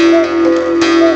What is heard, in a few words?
CUCKOO; CMI Sound Library Rev 1.3